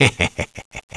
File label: laugh